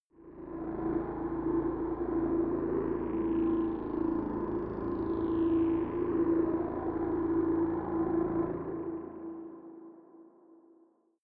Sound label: space calculating machinery